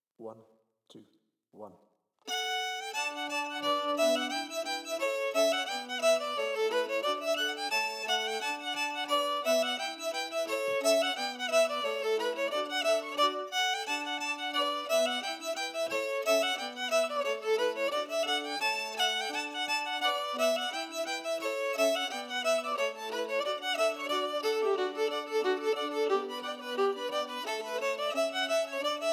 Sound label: melody emphasized